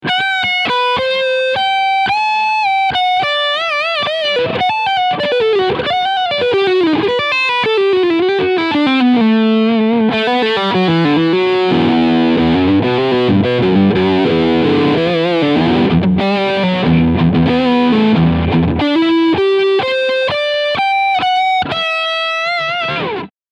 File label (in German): Leadsound Steg PU